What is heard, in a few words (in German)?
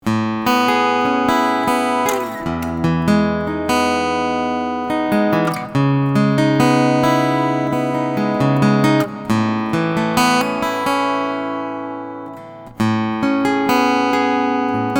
zoom a3 reverb hd, Akustikgitarre